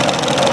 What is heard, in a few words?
Cog Loop; Playable Tutorial Level